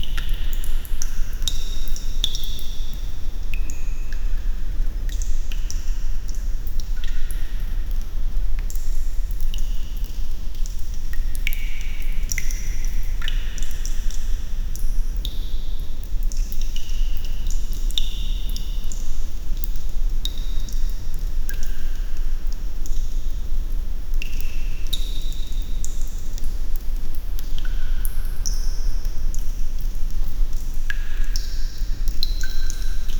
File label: ambient sound, underground